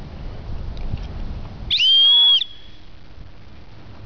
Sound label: whistle